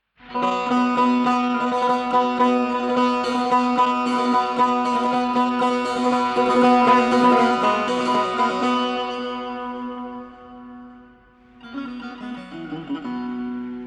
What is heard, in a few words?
Improvisation In Mahoor